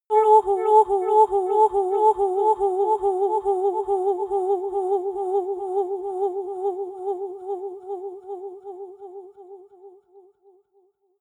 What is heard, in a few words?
woman sing high echo